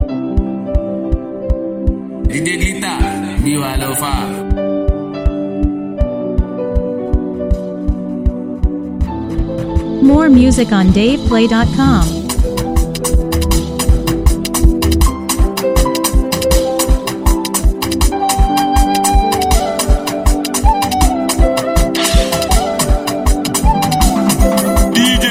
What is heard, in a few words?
Mara Beat